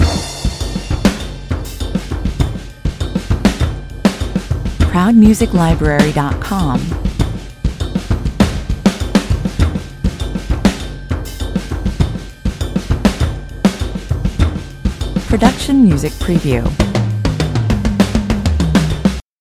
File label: rhythm section